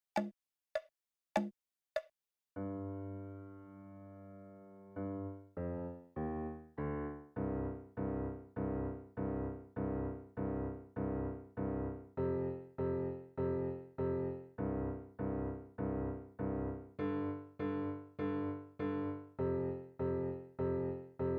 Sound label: Goblin Blues left